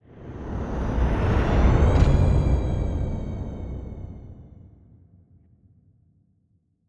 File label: ship juggernaut move; synthqueen; Stellaris; machineage